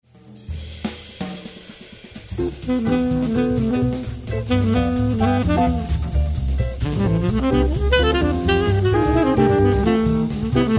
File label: Road Jazz